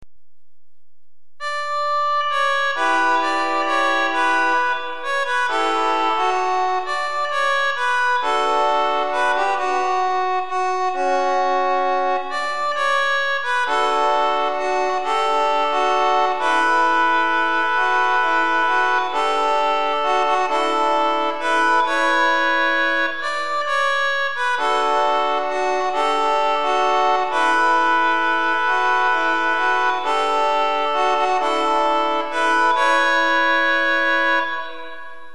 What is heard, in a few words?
chechen folk music